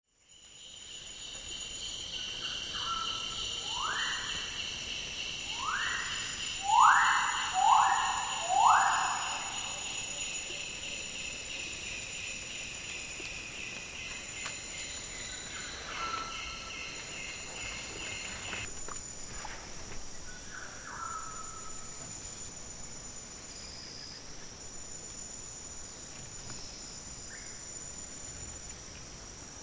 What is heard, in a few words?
Magnificent riflebird; پرنده تفنگمرغ باشکوه